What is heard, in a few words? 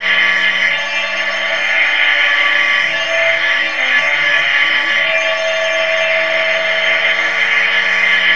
MELOGRAIN R, GRANULAR, Disjointed Textures; USB Soundscan vol.33; AKAI